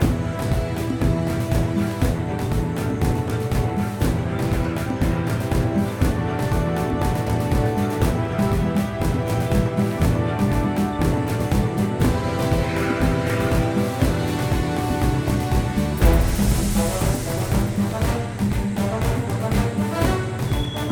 rainforest; Fieldrunners

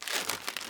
sound delete bin; Kerbal Space Program

